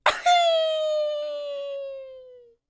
YELP, World Voices, ANDES FX

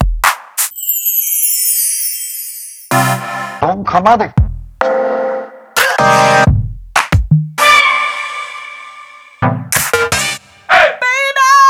Korg Volca Sample, Samples Sliced; m8

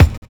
kick, Drum and Bass; Instrument Samples; EZ Rollers; Kick Set 2

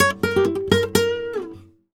PICADO GTR, Flamenco; Best Service ProSamples vol.46; AKAI

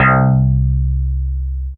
Single Electric Basses, Dance Floor Bass

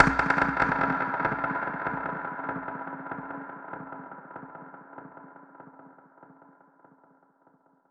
Perc Hit, dub percussion; musicradar

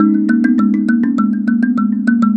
Mbira Low Echo; Melodic; Ambient-Melodics-II

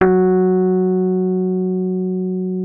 F#3, RHODES; Session Instruments; Best Service ProSamples vol.42

